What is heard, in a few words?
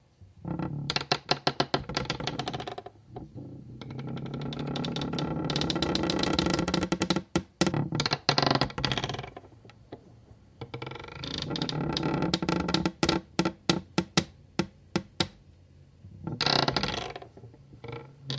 Creaks Tug Boat Gene